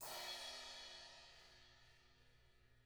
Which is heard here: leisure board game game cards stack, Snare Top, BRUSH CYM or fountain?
BRUSH CYM